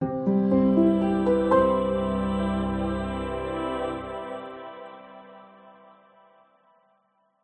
multimedia musical branding intro piano harp soft choir calm trustworthy